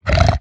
hoglin idle; 疣猪兽（我的世界）

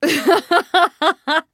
laugh

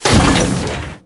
footstep; pekka

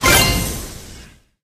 teleport use

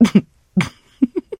trader giggle; 七日杀; 商人杰恩

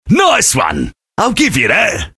hurt vo; sam

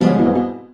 8bit piano hurt

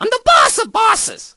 crow lead

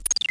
bleep

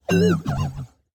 relieved beeps; 堡垒（守望先锋2）; 局内触发; 脱险

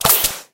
reload; maisie

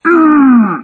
shield lost

